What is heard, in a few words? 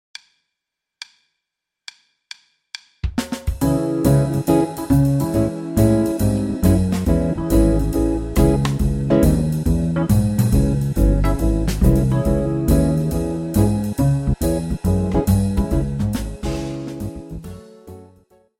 jazz feel